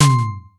impact soft hit

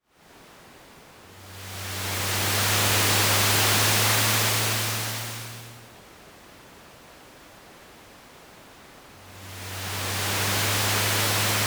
snow quiet wind

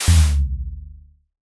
blackout low fold

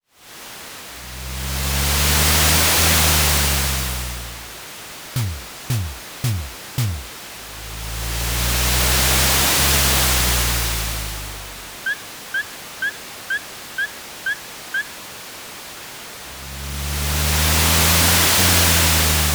city day crossing

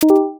stream start pop

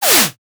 whip whoosh

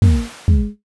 stamina low pulse

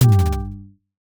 sports highlight hit

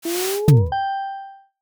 logo travel passport stamp

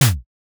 leaf step soft

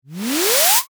short riser

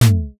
footstep stairs

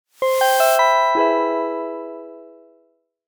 logo future glass mark

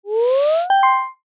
ui cloud sync finish; buttons ui

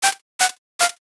foley scissors three cuts; daily life

